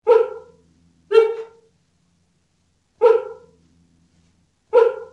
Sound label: cheeta